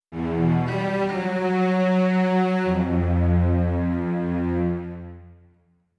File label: strings; Undead Overlord